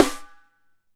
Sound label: rim, Alesis DM5